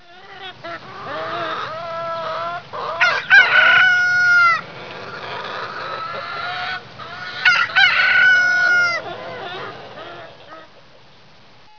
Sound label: COCK; 动物; 家禽; 鸡; 特酷声音素材库